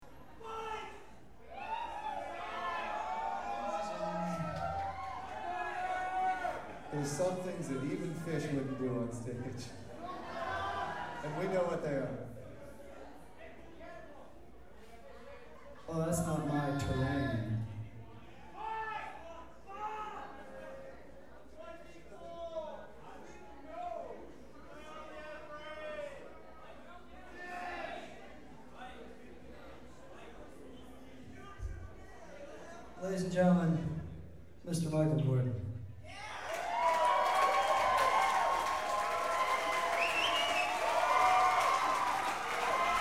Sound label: acapella